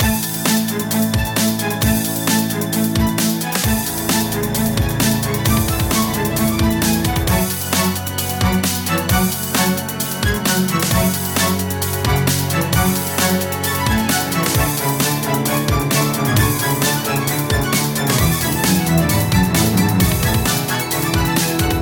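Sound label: strings with beats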